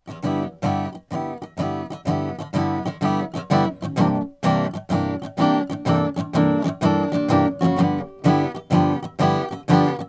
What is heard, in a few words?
mervyn davis smoothtalker ssc2 african, Guitars Acoustic; kiaat; red